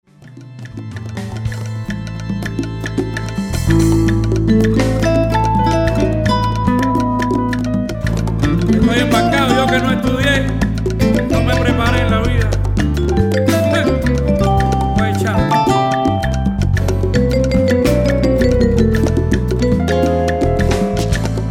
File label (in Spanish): Rumba